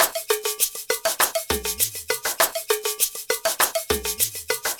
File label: Ensemble, Latin Percussion